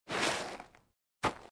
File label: plant; farm